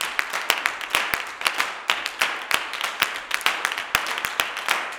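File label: CLAPS 96, Gospel; Blues & Soul; G.CLAPS 96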